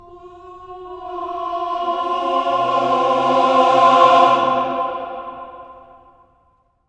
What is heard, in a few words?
CLUSTER, Voices